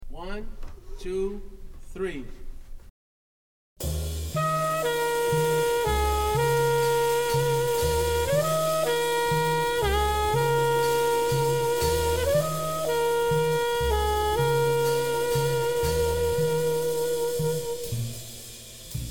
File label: minus piano